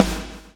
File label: BLOW; Spectrasonics - Backbeat